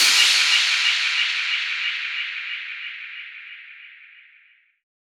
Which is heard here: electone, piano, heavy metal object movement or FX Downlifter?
FX Downlifter